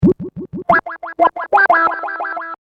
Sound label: Hip Hop Synth Licks 90 Bpm E Straight; Synth Loops; Royalty Free Synth Loops